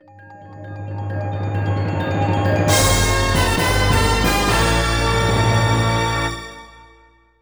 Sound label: Logo; Goal FH; 3DO